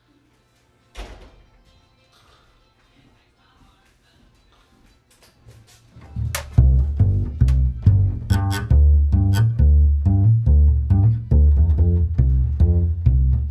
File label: bass